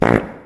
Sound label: claw hit; voice lines; drifter